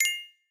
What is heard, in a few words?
new message